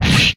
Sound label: Swipe